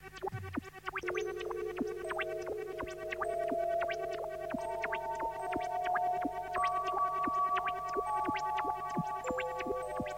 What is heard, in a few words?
radio noise; Radio Bagdad – Noise 2